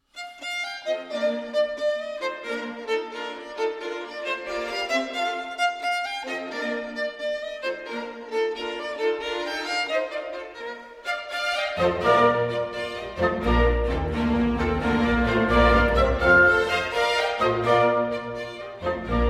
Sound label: Mozart Rondo For Violin and Orchestra in B-Flat Major